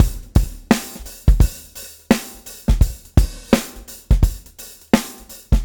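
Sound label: swing, swing shift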